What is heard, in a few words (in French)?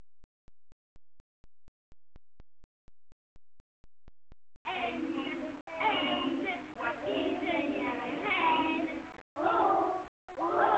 chanson noel par les eleves